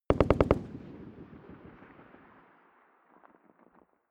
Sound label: rifle ak-47 exterior fire burst distant; Firearms; schusswaffen